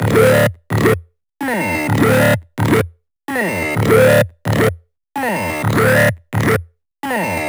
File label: Vocoder Loops 128BPM; Vocal Loops; Vengeance Samples